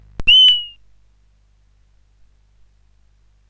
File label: sid CSG8580R5; California Games